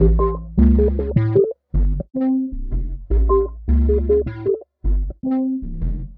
Acid Bass; Sonic Foundry (Sony Creative Software); Knutrix Drum & Bass

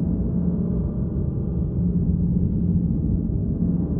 sparse soundscape samples; ReLooped

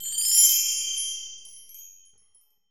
BELL TRE, BELL TREE; AKAI S-Series CD-ROM Sound Library VOL-5

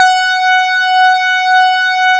SYN OSC ARP, Microwave; Sound & Vision - Gigapack I CD 2

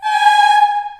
G#4, Choir, CHILD; CHILD IHS

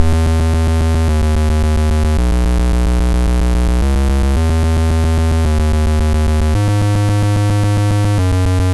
Apple II Bass, Chiptune; Sonic Foundry; Sony Creative Software

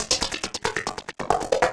percussion loops, Techno, 138

